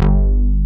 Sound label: SOLID SYN BS; Pro-Rec - Synthsonic; BASSES